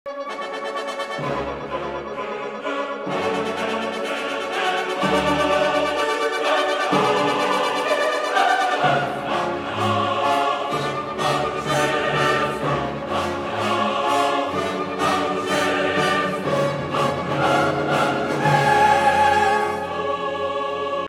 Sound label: Recitativo & Chorus; Mendelssohn Bartholdy (Felix); Elias